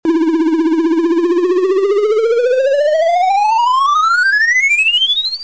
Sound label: Rocket Arcade; Doodle Jump; Android; Xbox 360